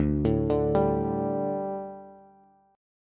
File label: D Suspended 4th D, down, Bass; arpeggiated